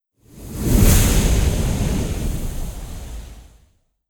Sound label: Air Wind